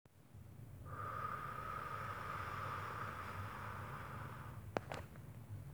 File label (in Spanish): Viento